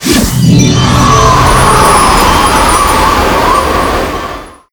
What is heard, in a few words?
Windy Witch Skill; Seer; GamigoNA